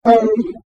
pit drone die; svencoop; legends

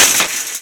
door chainlink close; svencoop; lostmaze

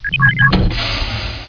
base use, doors; svencoop; quake1

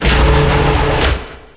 door move; mistake coop

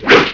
swing; uboa rampage ii

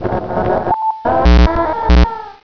child die, gonarch; svencoop; cracklife

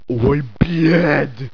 zombie pain; svencoop; cracklife

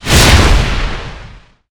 ice fire; ragemap2019

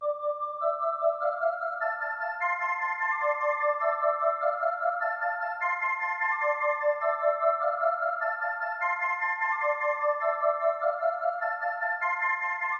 bells; svencoop; braindrain

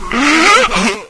alert, headcrab; mistake coop

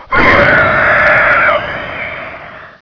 sci cat scream, scientist; svencoop; cracklife

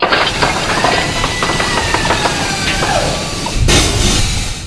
laser reload; svencoop; frostline

